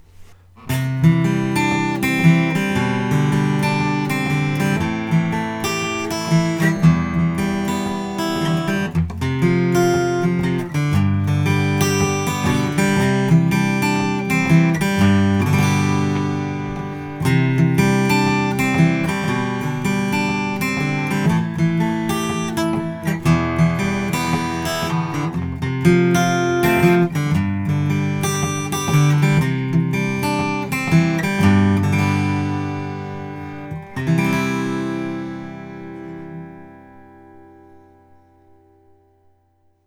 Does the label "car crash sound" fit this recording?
No